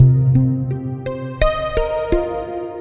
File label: Melody, IDM